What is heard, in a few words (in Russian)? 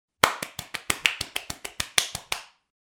zvuk ladoshek